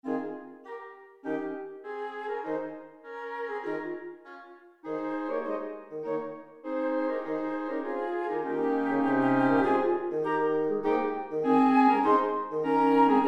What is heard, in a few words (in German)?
blaeserquintett